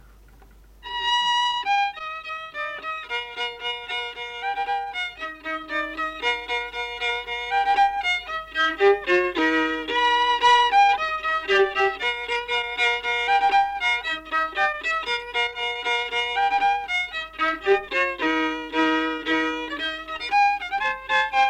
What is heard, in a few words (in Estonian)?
Ruhnu viiul folk; Pruute lugu; Pruutide lugu